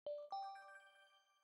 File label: suggestions Opened; NVDA; Random NVDA Sound Scheme Version 2